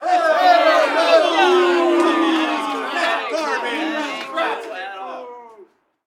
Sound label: street ball crowd boo; SFX Kit; Crowds